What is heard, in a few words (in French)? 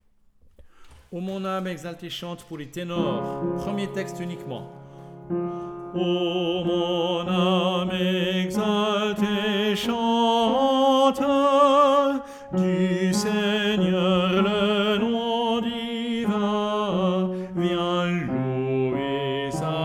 Chants O mon ame exalte et chante T